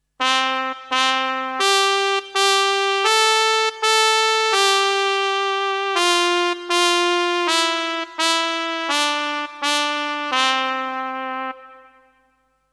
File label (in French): trompette